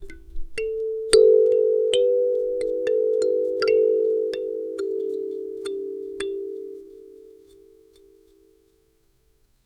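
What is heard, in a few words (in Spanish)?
estilo kalimba intima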